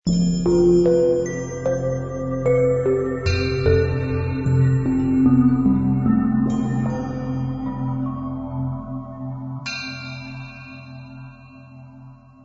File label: Music Theme